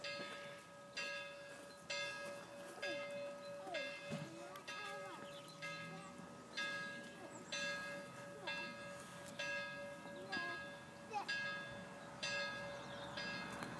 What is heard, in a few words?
Electrified Environment